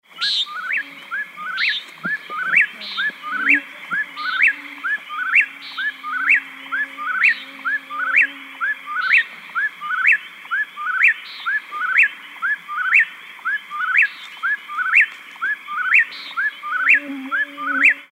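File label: Whip-poor-will, Common Nighthawk, Mosquito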